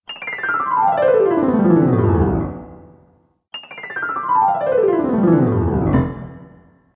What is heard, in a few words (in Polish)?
pianino